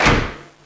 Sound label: WALL; twig; PC/CD Gamer UK 43